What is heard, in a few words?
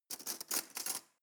cutlery teaspoon drawer handle